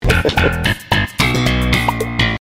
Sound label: sitcom transition bass riff; seinfeld